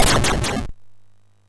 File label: sid MOS6581R4